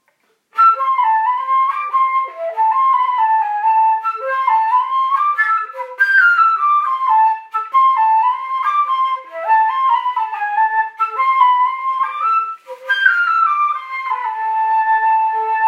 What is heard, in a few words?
Tamacun, flutes